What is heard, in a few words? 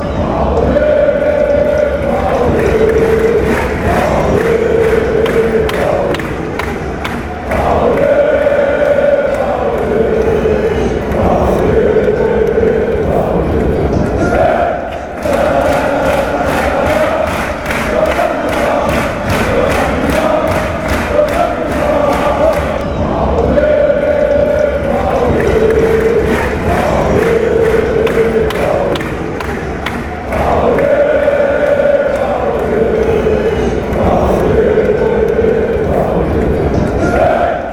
chant, fanchants; panathinaikos